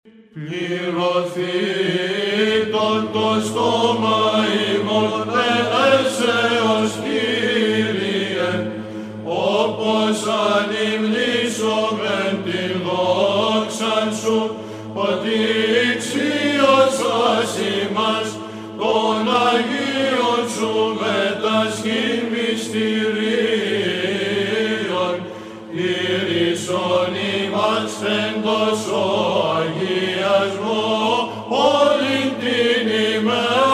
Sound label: Plerothetw 2nd Mode, Choir; Choir Of Estia